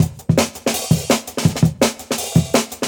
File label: Jungle Breaks